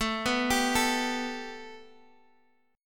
A 7th Suspended 2nd A Guitar Half Step Down, chord